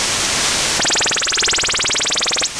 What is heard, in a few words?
OTH Radar Sound